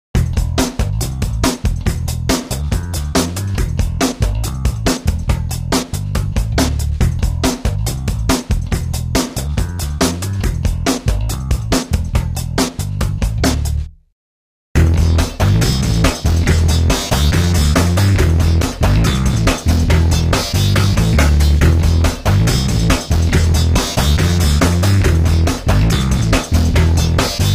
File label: metallurgy groove